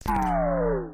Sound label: nightvision off; factorio 2.0.55